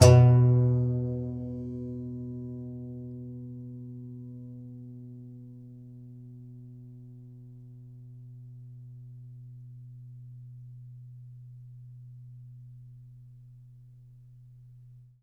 C. Bass Balalaika, Plucked Strings

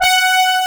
horn G4, synthesizer horn; AKAI S6000 CD-ROM - Volume 1

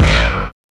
BLAST; East West; Global Sounds

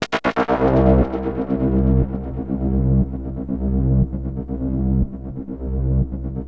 Access Virus B; Synthworx

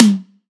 Tom High; Antidote Advent; Infected Track Drums

